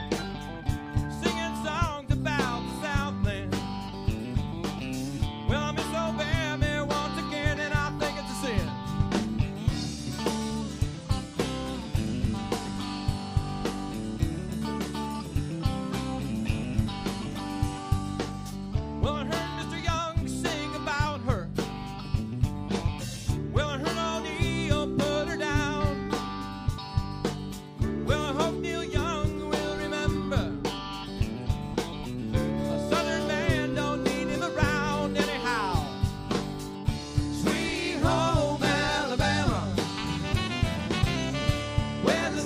Cover Band; Orlando

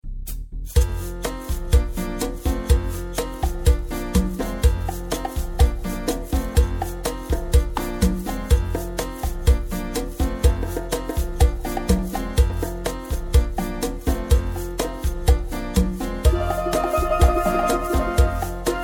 Steel Drum Player; Boston

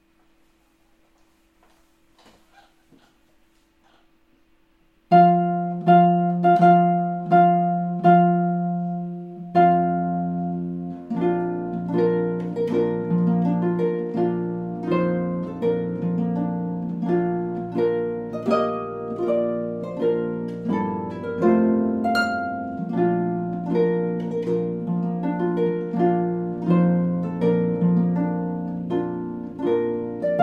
Harpist; Houston

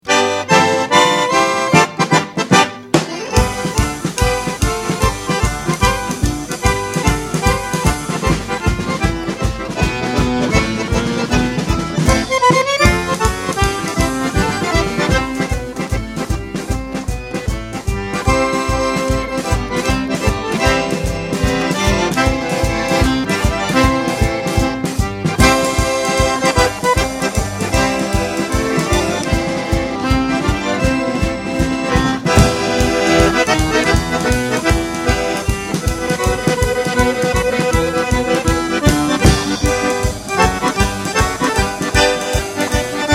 Italian Accordionist

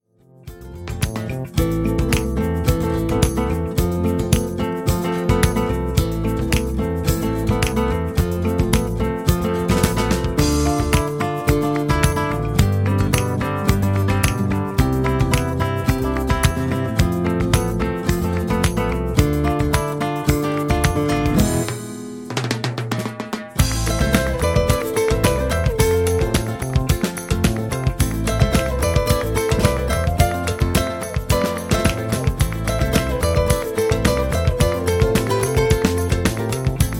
Flamenco Guitarist; Atlanta

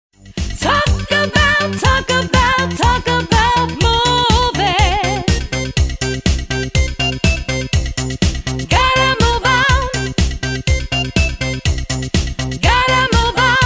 Party Band

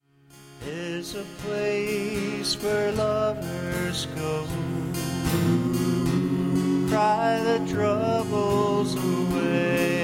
Barbershop Quartet; Toronto Barbershop Quartet

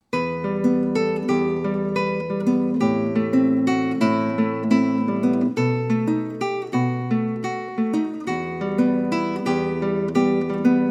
Brazilian Guitarist

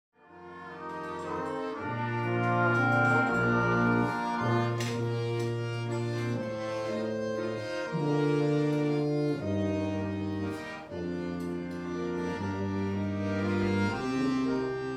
Oompah Band; Boston

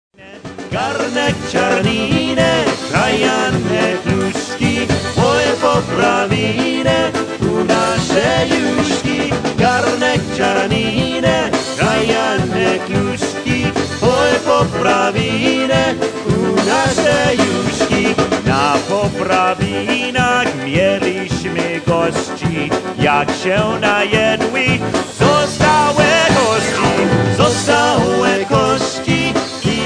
Polka Band; Detroit

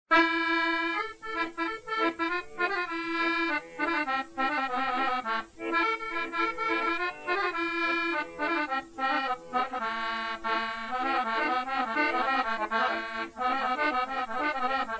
Italian Accordionist, Key A; Atlanta

